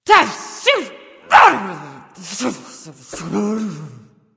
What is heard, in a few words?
grumbling; freya